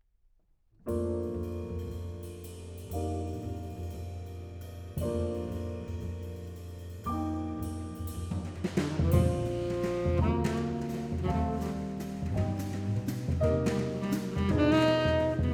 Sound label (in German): Jecklinscheibe; Das Mikrofon, Vol. 2; The History of the Condenser Microphone